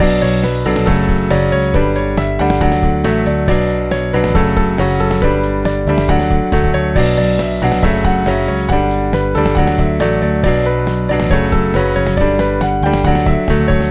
asphalt piano rock

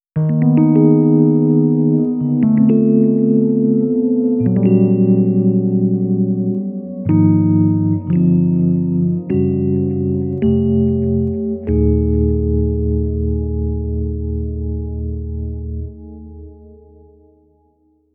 Rhodes Interlude

